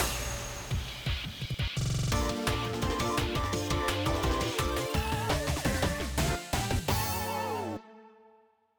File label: JGL Mission Stage Alterna Clear